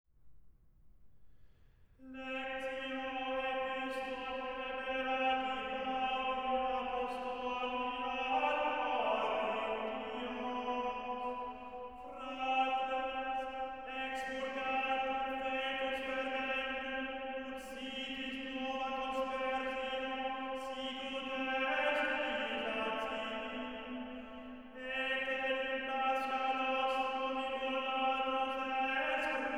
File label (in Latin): Epistola chant; Lectio Epistolae beati Pauli Apostoli ad Cor. Fratres Expurgate vetus fermentum